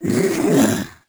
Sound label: confused; Crypt of the NecroDancer